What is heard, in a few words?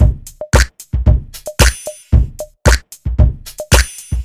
Drums Mix